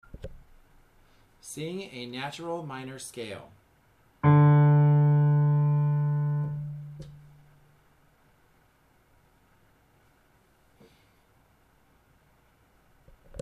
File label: Natural Minor Tenor